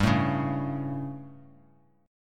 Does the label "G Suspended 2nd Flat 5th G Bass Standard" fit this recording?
Yes